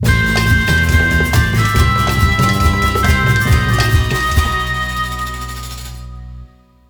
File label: Match Lose; Bald Bull